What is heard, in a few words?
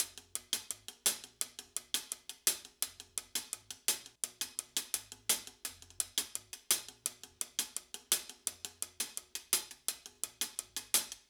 Drums, 70s Analog Funk; Sonic Foundry (Sony Creative Software); What it Is! 70s Analog Funk